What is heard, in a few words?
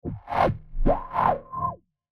Whoosh Pan Musical Short; Blastwave